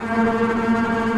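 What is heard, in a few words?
bass tremolo A#3; Digital Sound Factory Virtuoso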